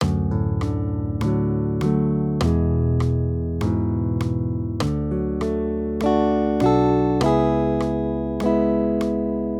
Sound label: maj triads